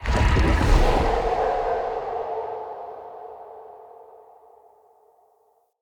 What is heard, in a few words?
Wolf Pack Cast; Orengall